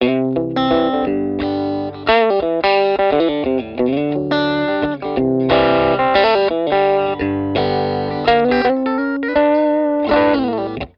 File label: STRAT POS 2 WARM DRIVE OVERDRIVE LICK, S pickup set